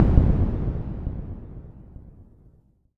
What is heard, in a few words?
tank fire; armora